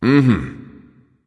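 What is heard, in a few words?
non-verbal agree; smite; voicepack; houyi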